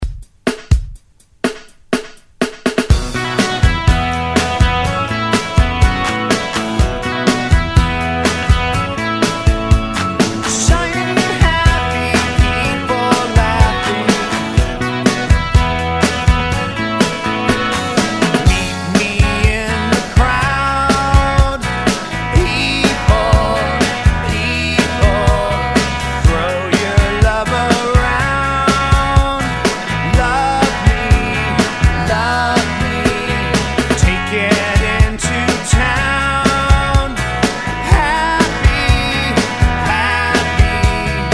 90s Rock ReDrum 123Bpm; DJDOLLS

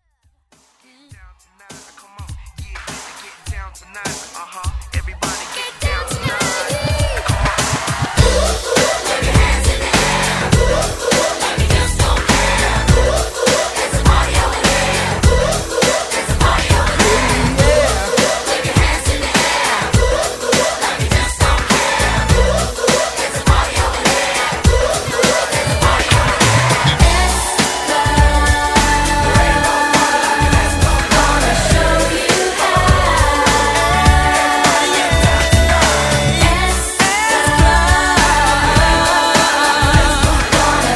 90s Pop; Redrum

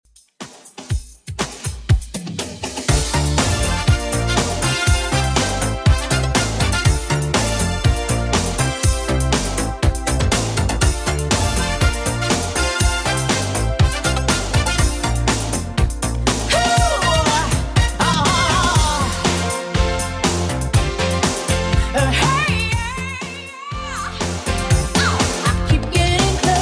90s Redrum 121bpm

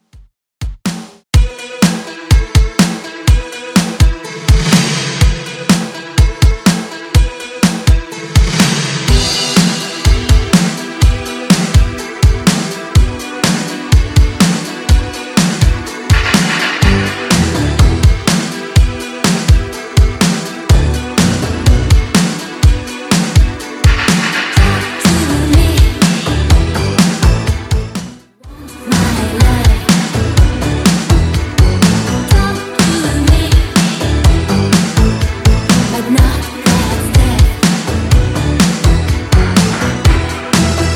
80s Real Drum 124bpm

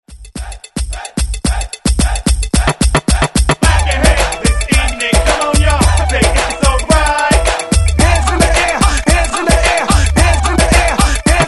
Hype Re Drum 110 Bpm; DJDOLLS; remixplanet

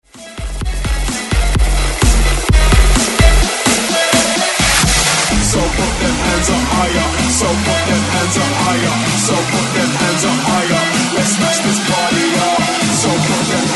130Bpm BREAKMIX

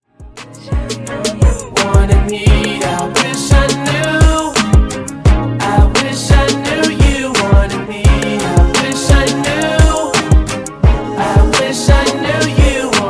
Funk Intro Outro Clean 86Bpm

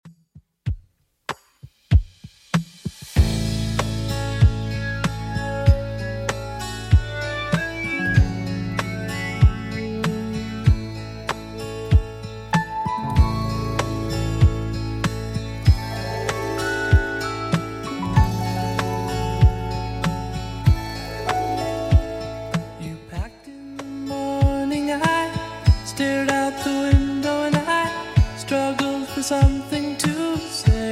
70s Redrum 96bpm

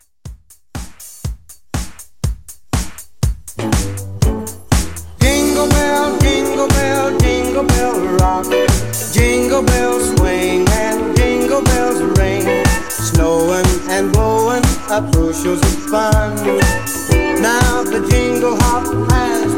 Christmas Re Drum 121

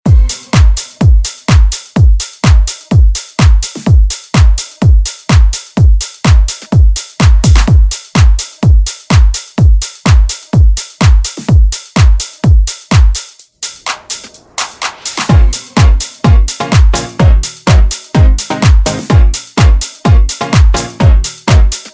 Latin House; Redrum

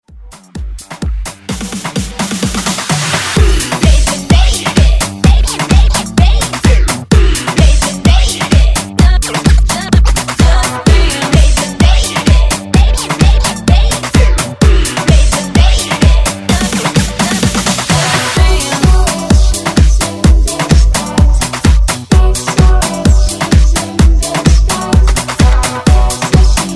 Afrobeat Hype Intro

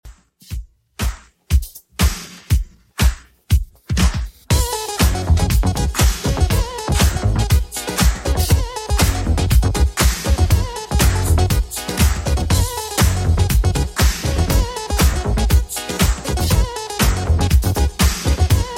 80s Redrum 120bpm

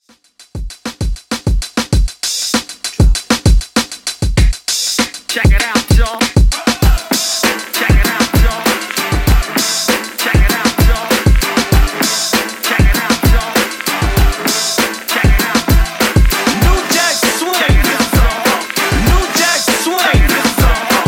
New Jack Swing Classic Redrum 98Bpm

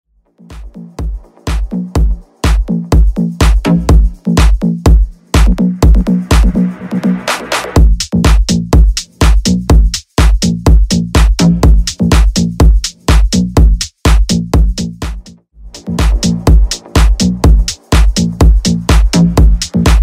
House 124BPM

